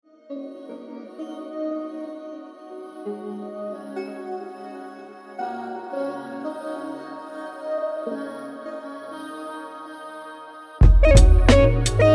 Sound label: jazz; 5am; weeklybeats-2018